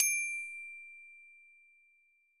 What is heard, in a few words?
glockenspiel D8